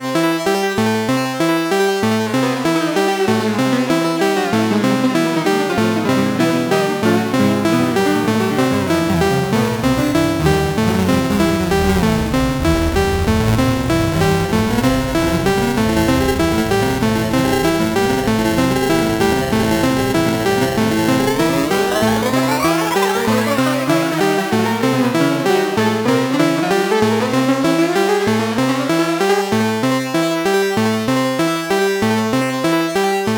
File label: Bastl Thyme LoFi Tape